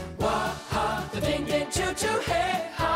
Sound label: Ding ding choo choo